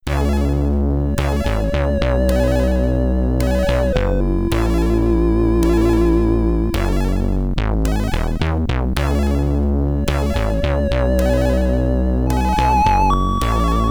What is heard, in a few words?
BGM, SID Stereo, commodore 64; deadlock